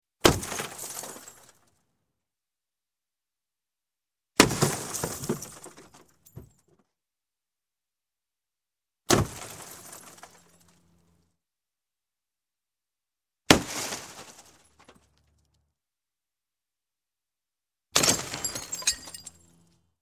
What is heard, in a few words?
Glass, Smash Smashing Automotive Window, Crash; Sound Ideas 2000 Series